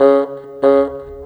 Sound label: Bassoon, Orchestral, Rock Pop